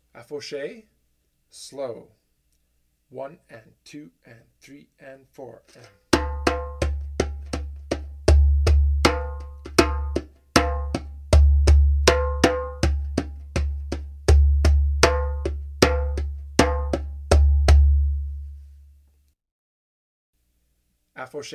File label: Afoxe